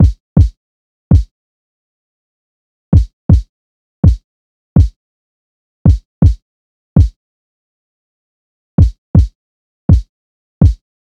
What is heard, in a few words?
Drum Loop, 164 BPM Kick, Boom Bap; Cymatics; GHOST (Beta Pack); Darling